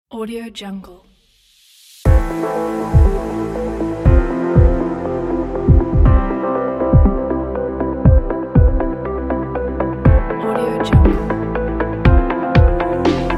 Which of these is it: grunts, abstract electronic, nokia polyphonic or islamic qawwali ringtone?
abstract electronic